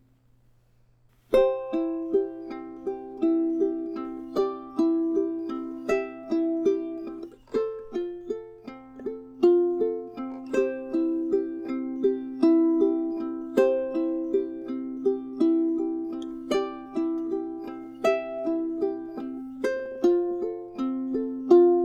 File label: Ukulele Class